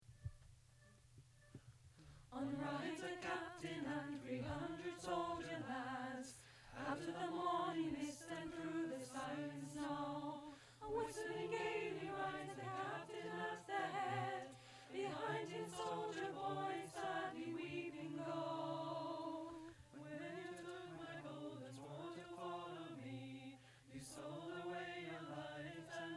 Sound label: Blood & Gold Bari; training recordings